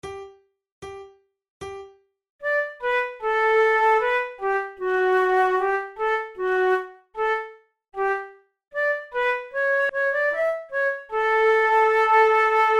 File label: gavotte, flute